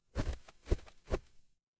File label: paper fabric cloth whip